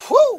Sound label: "hoo", voice